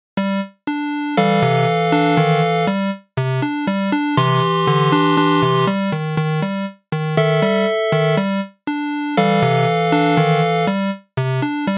Jazz Organ, electronic keyboard, Single dry instruments; MIDI file of a Bach piece